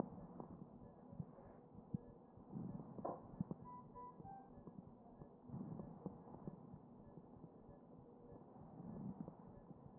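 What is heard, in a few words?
anterior chest, position 1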